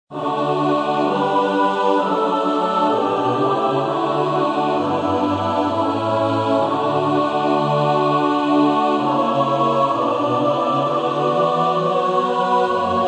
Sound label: Choral; pasion segun san mateo 44 coral; johan sebastian bach